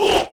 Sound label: Magician hit; AquilasTemple